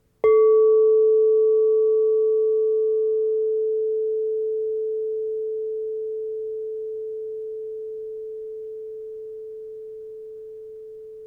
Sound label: frosted 8inch A -12, crystal bowl